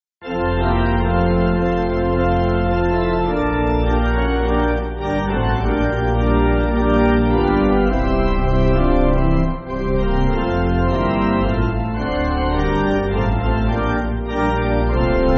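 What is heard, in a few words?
Pipe Organ, Music Backing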